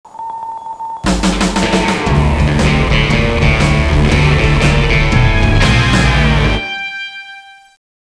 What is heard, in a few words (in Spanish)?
ronda win; ze base